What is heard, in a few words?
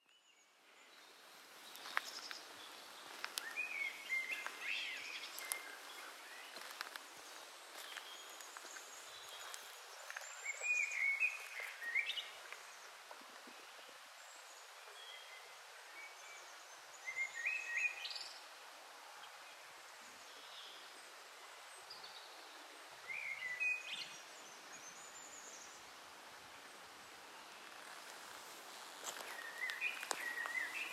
Gelli Aur